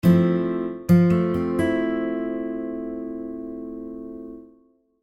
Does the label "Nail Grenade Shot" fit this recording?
No